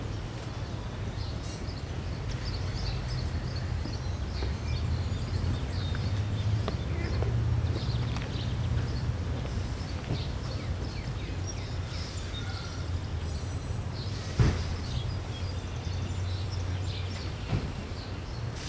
City Late Small Bed, Ambient; Civ4 warlords

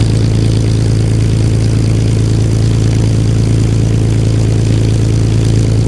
spitfire rear, engines; War Thunder

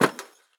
walk proflist; Escape from Tarkov

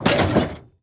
snowmobile suspension light; Call of Duty Modern Warfare

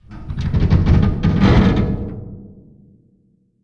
ac130 metal stress

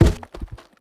objects, World of Tanks

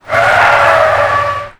tire skid cement short; Call of Duty Modern Warfare

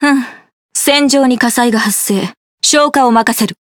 Alarms Fire Alarm; Kongou; World Of Warships; Arpeggio

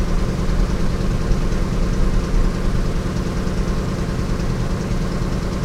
hellcat cockpit r, engines; War Thunder

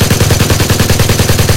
aa machine gun small loop

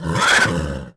Horse Die Vox; Civ4 warlords

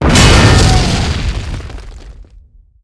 explosion trophy metal sharp; Explosions

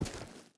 footstep rubber boots gravel walk; Rust

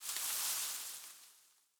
leaf rustle grassy hard

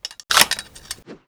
Inf Weapons Foley; Red Orchestra Ostfront 41-45

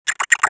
drone chirp

